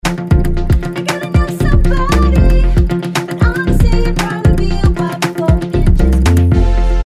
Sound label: B Vox